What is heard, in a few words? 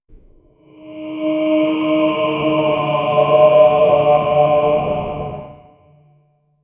dark villain theme low; dark villain theme low br